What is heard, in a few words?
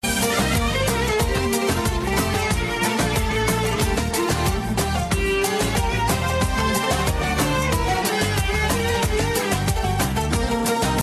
Georgian oriental